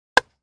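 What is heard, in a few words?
baseball sounds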